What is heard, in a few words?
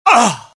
death, pain; mohaa; dialogue